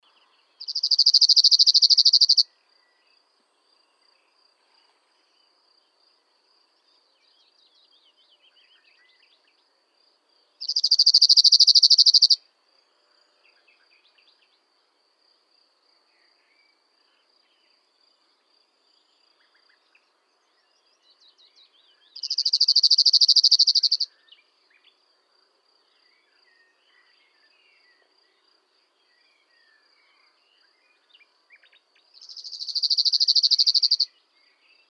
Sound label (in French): bruant zizi chant